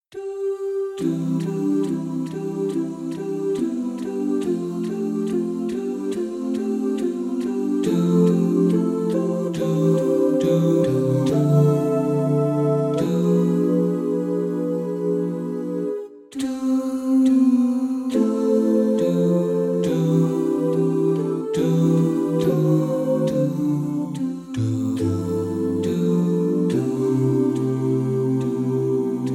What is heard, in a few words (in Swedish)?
Bas